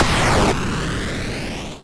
explosion medium

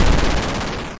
exp cluster, Explosions

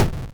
explosion shortest soft

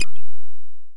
interaction

